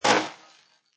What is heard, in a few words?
Impact Hard Electrical Reverb; Scifi Production Elements; Science Fiction Sound Effects